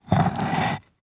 Stones scratching

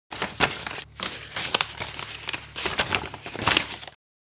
Paper handling unfolding

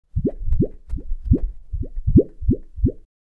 Cartoon underwater bubbles

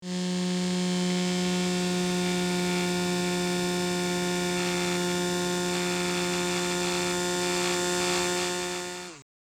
Industrial elevator loud noise loopable

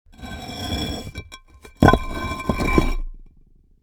Big sewer cover plate dragged on concrete

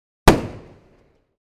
Fireworks firecrackers single explosion

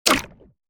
Aquatic water game menu ui error

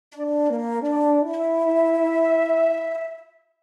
Video game reward chest opening flute

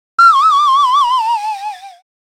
Cartoon falling down whistle

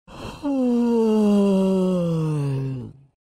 Long tired yawn from a male

